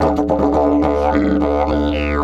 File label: 108, Didgeridoo